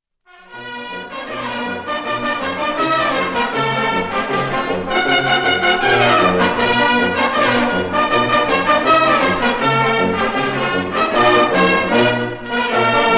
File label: Fanfara Bersaglieri, Italian march; La Ricciolina; Italian marches have a very light musical feel, often having sections of fanfare or sopran